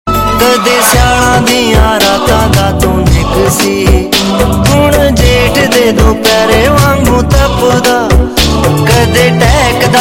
Punjabi New Sad Tones

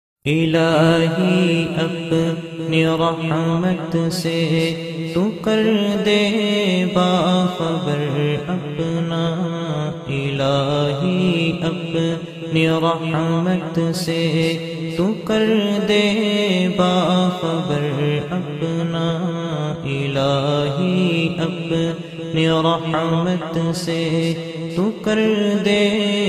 New Islamic Ringtone; ilahi Apni Rehmat Se; Islamic Ringtones

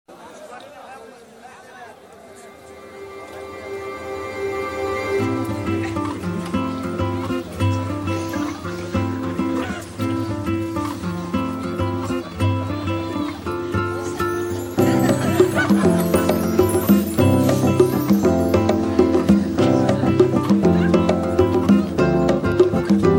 Ambient Chillout Ringtones

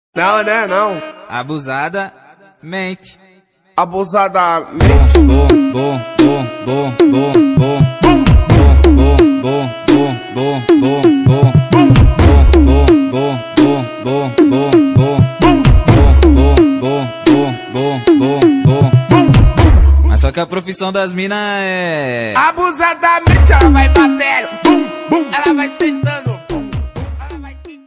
Arabic Ringtones

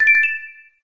Music Shake, ui; Mi Mix 2S Stock Ringtones

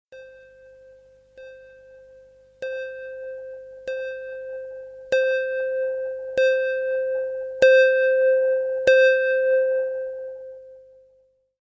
Serene Alarm Bronze; Bangolufsen; Alarm Tunes

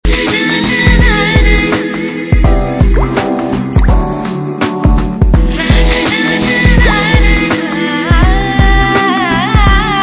Famous TV Ads Ringtones

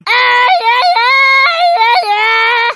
Drunk Tarzan

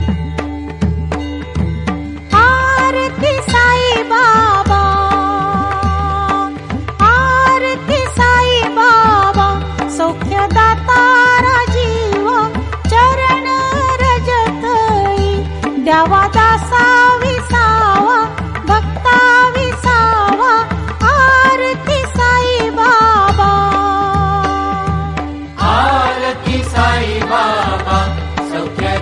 Aarti, Devotional Ringtones; Sai Baba

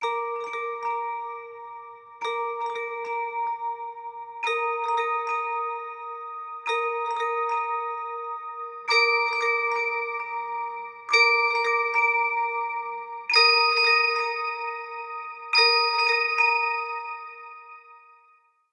Zen too; Google Pixel 3 Ringtones

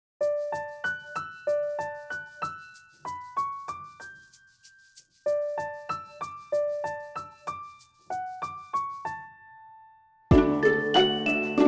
innocence, ringtones; OnePlus 5T Stock Ringtones

